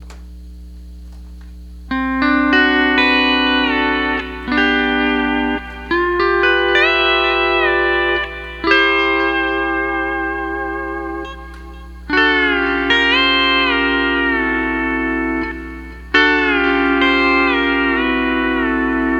1st String

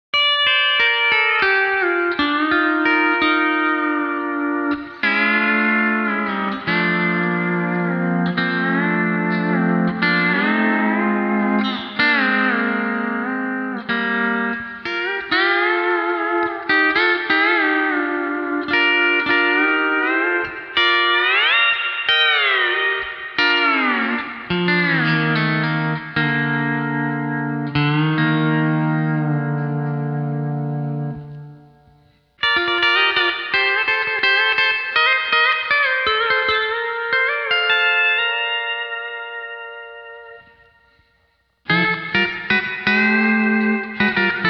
Twin Plate Reverb; ToneX Pedal

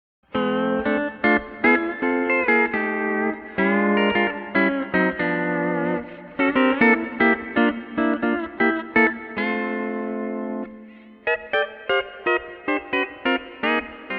Stereo Reverb

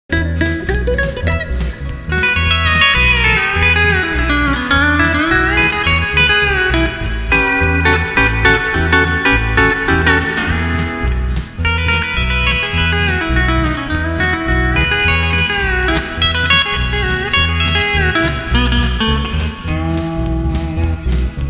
Pick Block; Licks

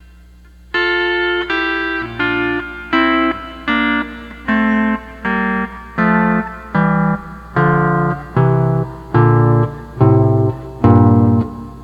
12 String Lap

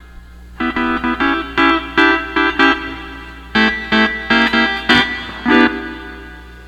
12 String Lap

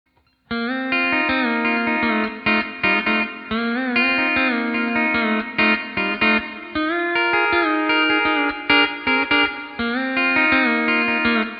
D13 Tab LKV and F Sharp to A Knee Lever; D13 Copedant Tabs

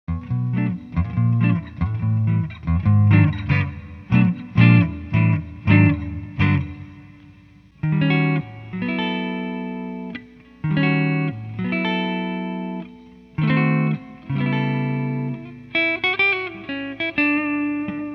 archtop electric, Silkyn Tube Amp

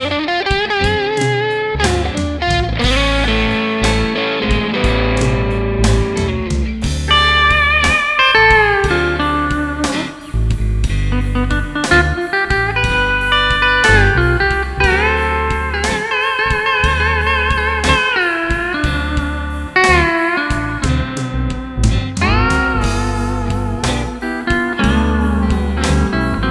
Blues Steel

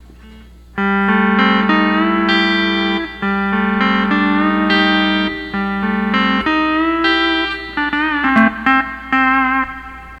12 String Lap

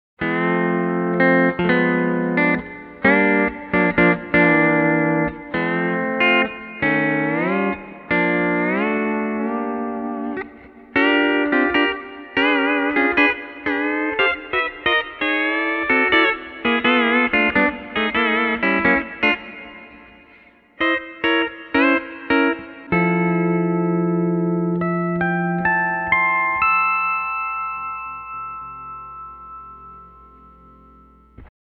D13 pedals 1,2,5,6,and 7 Axe FX3; D13 Copedant Tabs

